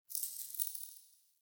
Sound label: Idle Head Shake